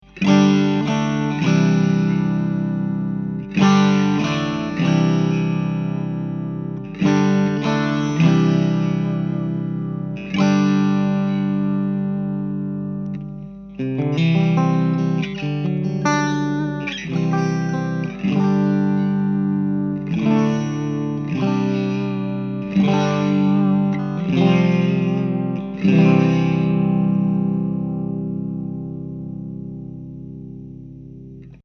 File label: vox gain